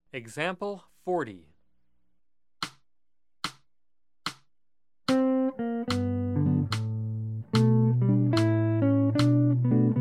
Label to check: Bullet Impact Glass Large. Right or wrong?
Wrong